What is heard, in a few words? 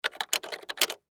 Technology Computer Keyboard Type Short; Typing Sound Effects; Typing (Sound Effects:Offices)